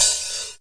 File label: Gated Reverse Slapback, hip hop; drums full kits; style kits